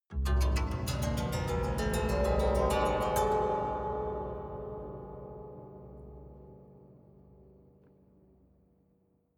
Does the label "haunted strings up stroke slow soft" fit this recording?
Yes